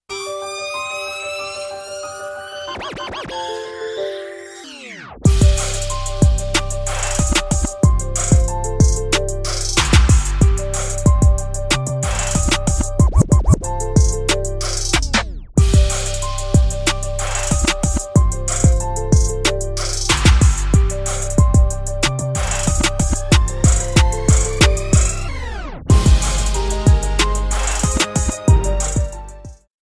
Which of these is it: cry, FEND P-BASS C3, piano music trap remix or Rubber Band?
piano music trap remix